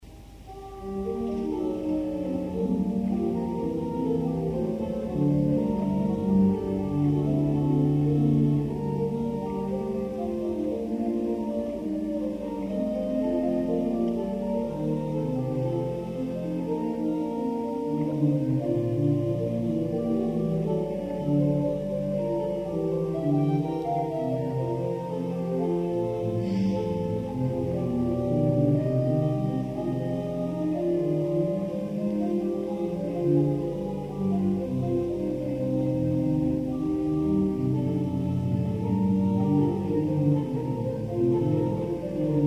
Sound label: Flutes, organ; orgue Clicquot de Saint Nicolas des Champs sur les "Flûtes"